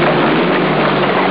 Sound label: tank; Amiga Soundtracker Sample Packs